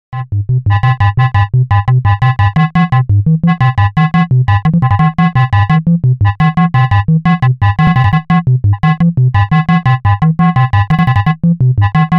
3 OP PM Single Cycle Sine

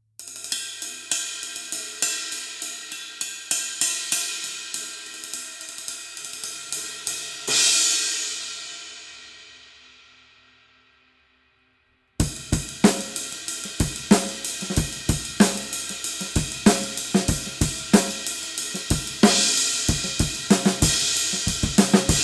Transition Ride

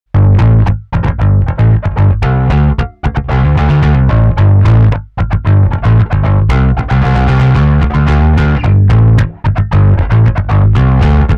EBS Classic Distortion

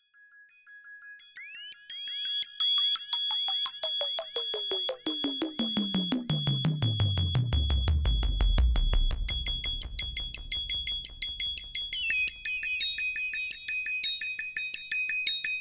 syrinx triangle high sequ

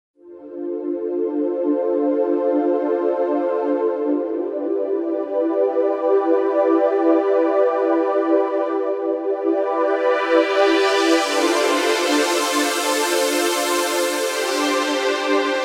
R3 Warm Pad

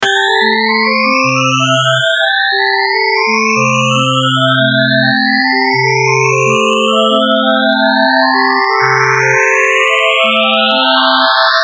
treshold and moving filter

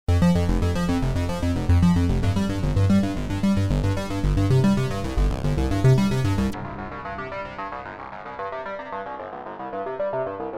Nord Stage 3 Filter Arpeggiator

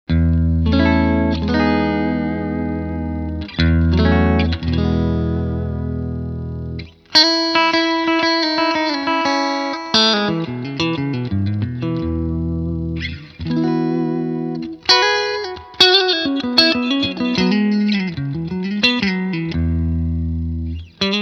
Fender Tone Master Princeton Reverb clean

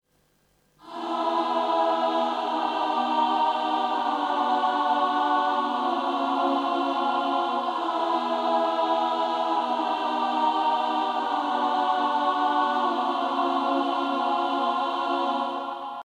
Choir Steinhof Kirche Vienna; VSL MIRPro3D; EW Elves

